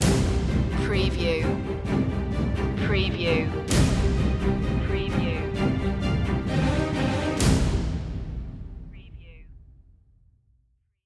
blood sweat and fears stinger, An orchestral piece; tension drama